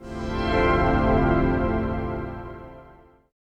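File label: jazz chord, pads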